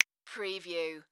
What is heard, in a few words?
Finger Snap